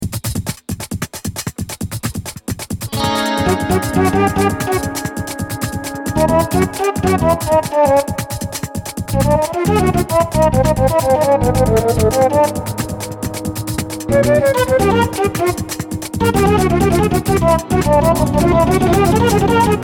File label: Tension